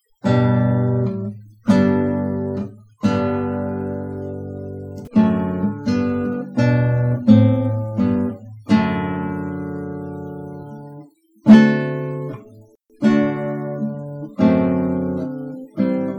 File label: classic guitar